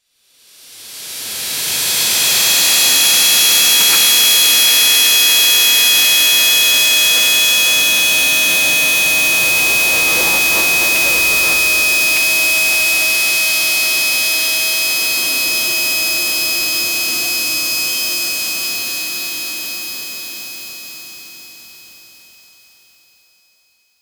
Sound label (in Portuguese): faca um som de rajada, cosmic